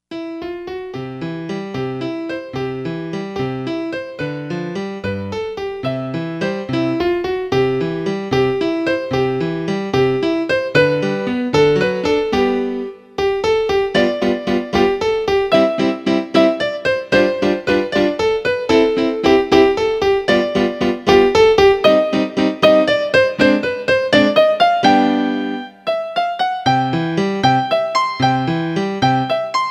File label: piano